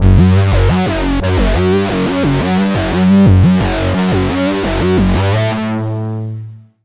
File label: Lead, Spire; Routed Sound; Spire Expansion - Quantum Enforcer Vol.1